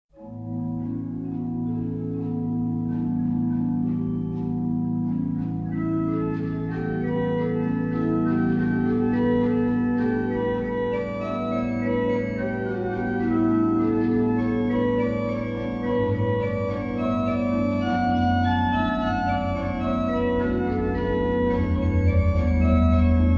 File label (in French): IMPROVISATION ORGUE